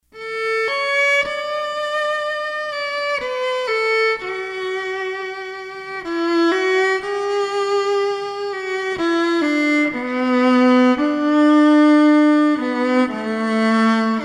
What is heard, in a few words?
acoustic